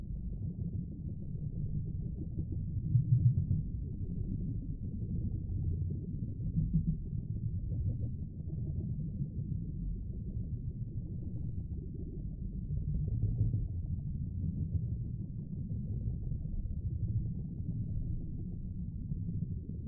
Atmos Deep Rumbly Underwater Synthesised Low Clicky; Submerged Tapes; Alien and Imagined Atmospheres